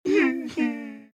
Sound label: Scared Whimper